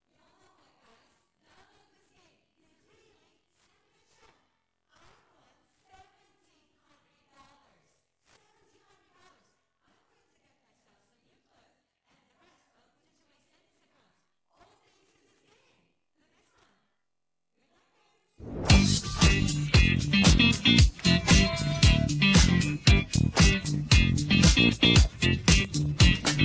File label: no vocals